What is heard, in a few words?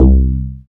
BAS FM DX-7